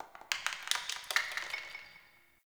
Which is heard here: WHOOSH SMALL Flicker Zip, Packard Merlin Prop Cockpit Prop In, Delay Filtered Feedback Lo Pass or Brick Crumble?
Brick Crumble